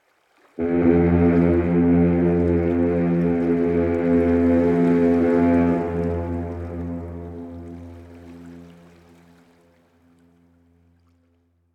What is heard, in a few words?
Ship passing by fog horn distant perspective